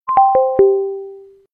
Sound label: Recharge battery; Nokia N79; Audio themes